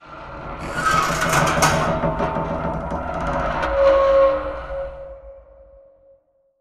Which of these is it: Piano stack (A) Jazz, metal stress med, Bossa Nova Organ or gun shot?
metal stress med